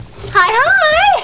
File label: Hihi